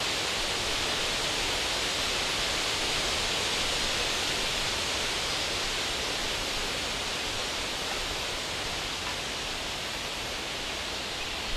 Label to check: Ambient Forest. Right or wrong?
Right